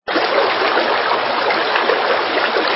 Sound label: Water